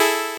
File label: 8bit se